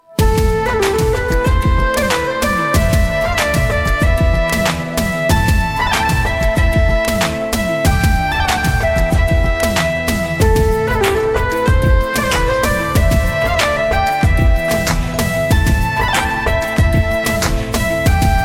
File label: Bgm